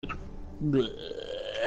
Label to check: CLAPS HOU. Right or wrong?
Wrong